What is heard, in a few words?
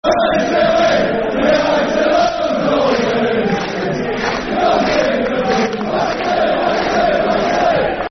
Singing, Football Chants (Soccer Songs); Sheffield Wednesday Swfc